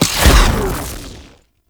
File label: Bow Arrow Rain Explosion; Ultimate Abilities; Madurai; Warframe; Focus